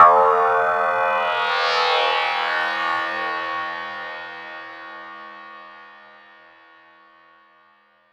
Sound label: Tonal FX D#